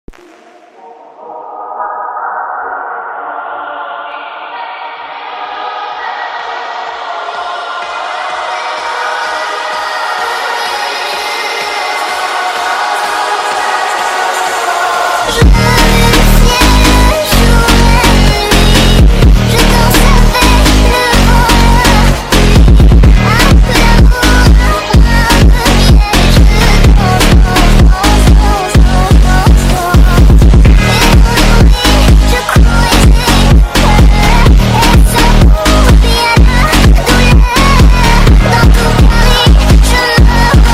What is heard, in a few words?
Dance Funk